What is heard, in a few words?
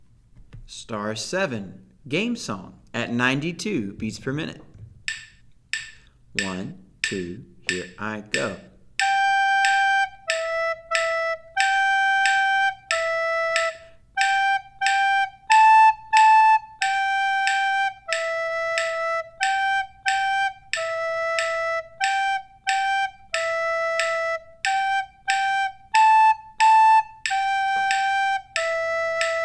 92 bpm; Recorder; Game Song; Be A Recorder Star Songs